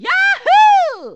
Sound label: long jump; toad